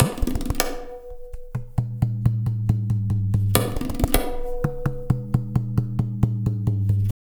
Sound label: tabla, 135 bpm; Zero-G; Pure Tabla